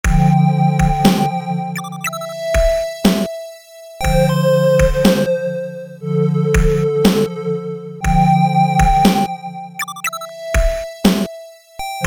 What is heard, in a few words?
chill chiptune